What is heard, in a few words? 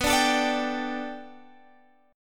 B Minor 7th Sharp 5th B Guitar Half Step Down, chord